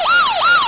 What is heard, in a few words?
Cop Siren